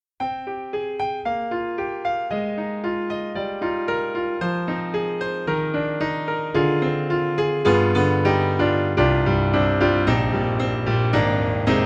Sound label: Italian Grand Mix Mic; VI Labs; True Keys